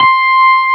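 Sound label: Wurlitzer C5 - L; USB Soundscan vol.09; AKAI; Keyboards Old School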